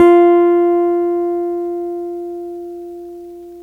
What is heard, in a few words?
CHAPSOLO, CHAPMANSTICK; Greytsounds